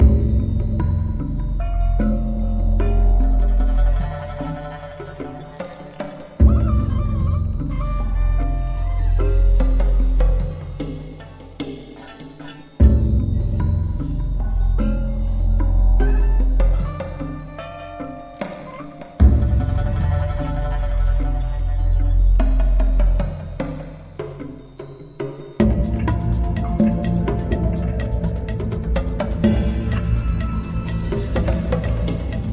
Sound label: Kung Fu Master Ambient